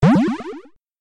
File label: close mask; kill the virus